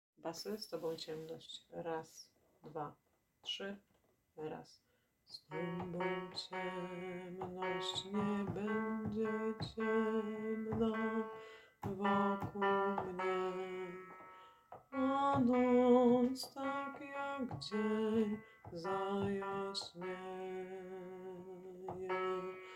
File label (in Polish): Z Toba ciemnosc bas; Taize